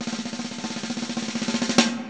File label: Snare Drum Sound